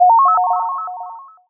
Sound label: gift heart; frontline